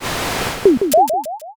gacha roll

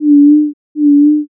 owl

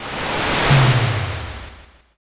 fire magic

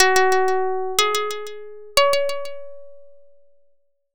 koto

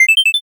sparkle

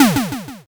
hit

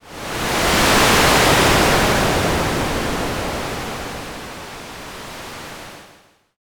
wind

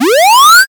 charge

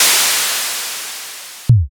airlock

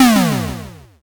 explosion m

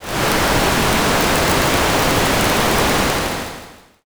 fire

